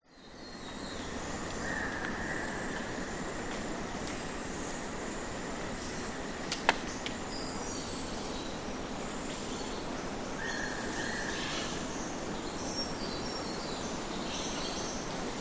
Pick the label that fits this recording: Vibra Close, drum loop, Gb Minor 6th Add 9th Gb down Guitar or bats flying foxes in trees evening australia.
bats flying foxes in trees evening australia